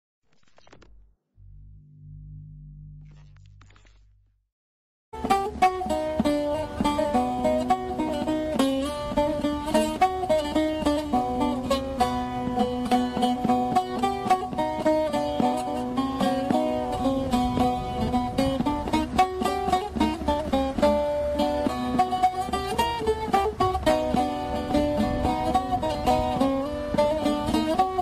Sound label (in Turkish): türküsü